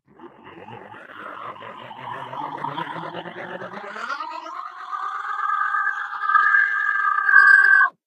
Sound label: ballbot infinifling